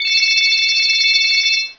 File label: Ringer; Asus P525